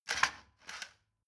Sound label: motorcycle harley engine ignition